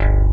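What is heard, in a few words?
ELECTRIC BASS; Pro-Rec - Synthsonic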